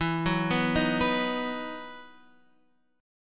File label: E Major 7th Suspended 2nd, Guitar, Half Step Down; EM7sus2